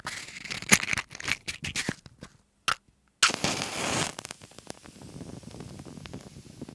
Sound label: matches light; best service Blue Box